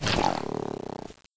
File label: splauncher reload aim